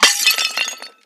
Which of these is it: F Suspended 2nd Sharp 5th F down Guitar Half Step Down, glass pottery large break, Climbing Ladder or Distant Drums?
glass pottery large break